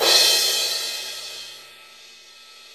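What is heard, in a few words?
cymbal crash; Best Service Gigapack 2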